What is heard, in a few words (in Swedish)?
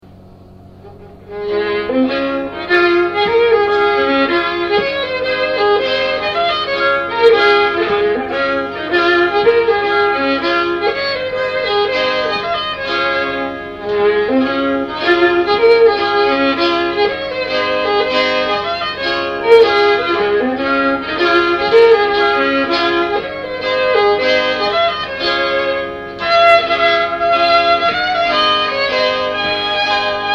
Polska